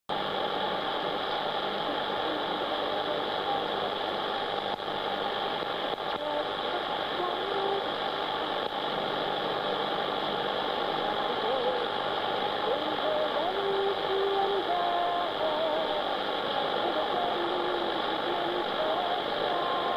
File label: 6210 kHz, pirate radio; suzuki